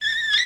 Brake

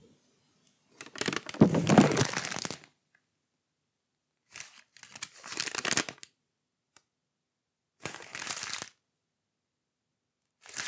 Crumpled Paper

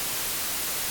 White Noise

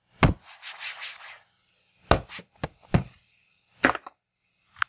Hitting Hand On Surface Exploring

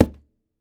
Found Trashbin Plastic Hit

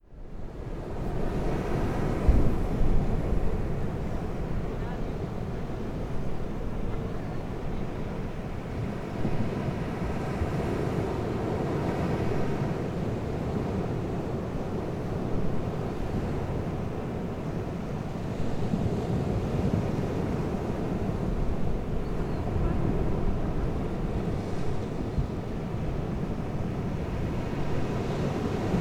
Waves Wind

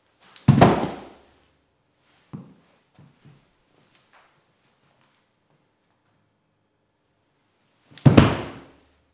Person Falls On Ground

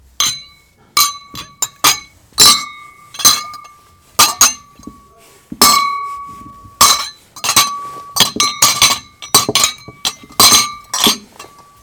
Moving Glass

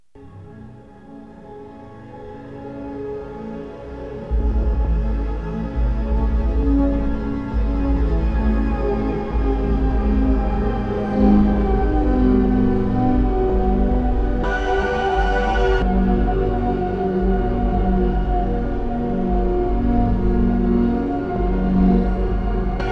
Matrix Redux

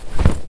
Dropping Body On Hard Ground

